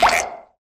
Bird White Special; fowl

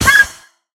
Cherry Leaf Destroyed; fowl

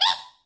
Bird White Collision; fowl

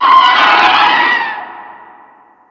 Mighty Eagle Selected, Birds; fowl

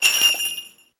Christmas Bell Collision; fowl; Christmas Bell Blocks

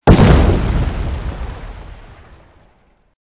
Mighty Eagle Collision; fowl

